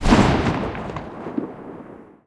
mg 8mm mg34 lastshot; guns; tanks; War Thunder

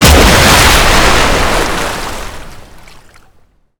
Projectile Sounds; Red Orchestra 41-45

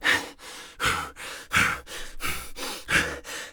breath sprint; Escape from Tarkov

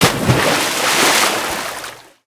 torpedo splash

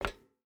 footstep hide metal walk; Rust

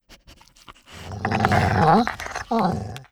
dog idle look

